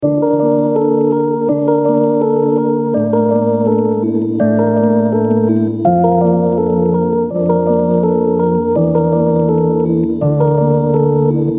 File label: D min 165 BPM Keys